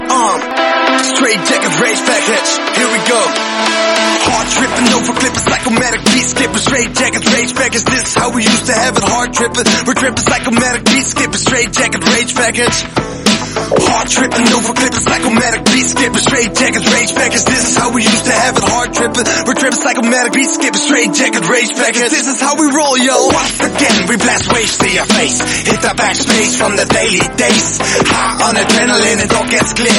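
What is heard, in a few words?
Hip Hop Mashup, ringtones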